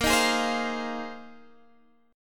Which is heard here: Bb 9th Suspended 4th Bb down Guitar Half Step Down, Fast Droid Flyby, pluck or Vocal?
Bb 9th Suspended 4th Bb down Guitar Half Step Down